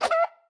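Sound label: Chicken baby attack, 初级形态攻击音效; 母鸡会用自己的喙不断地啄对手; 绿野世界; 卡通农场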